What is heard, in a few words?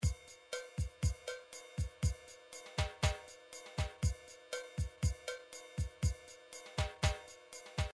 midi drum patterns drums